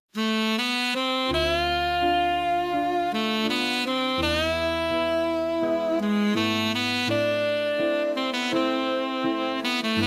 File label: saxophone horizons